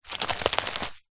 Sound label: footstep single slow sneaky dry leaves forest floor; zapsplat